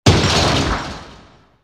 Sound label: crash